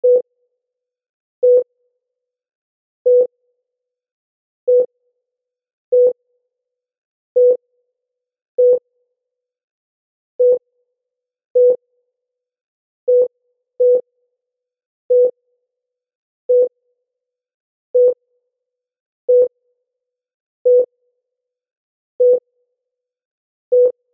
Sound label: medical heart rate monitor irregular